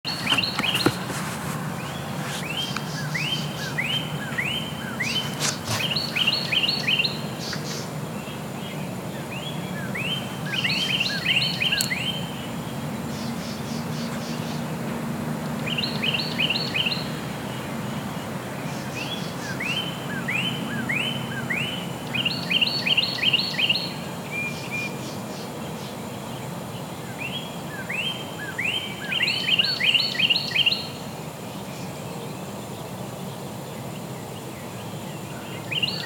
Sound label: Birdsong